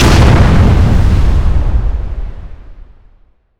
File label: Explosions Explode Ground; DCS